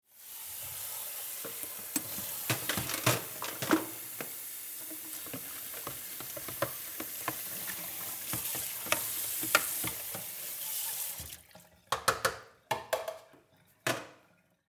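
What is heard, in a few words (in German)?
Espressomaschine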